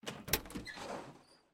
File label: Open Door Basement; Game rip; MiSide